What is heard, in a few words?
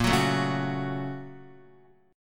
Bb Minor Major 7th Sharp 5th, Guitar; BbmM7#5; strummed; x,1,4,2,1,1; JGuitar